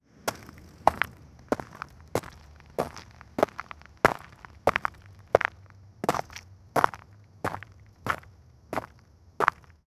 footsteps

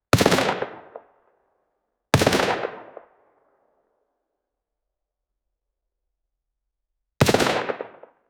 anechoic AR-15 gunshot

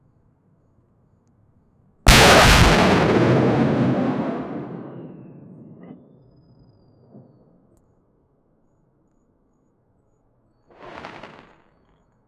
realistic whooshing sound, explosives

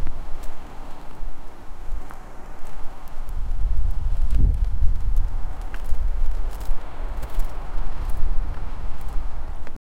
wind blowing across the landscape

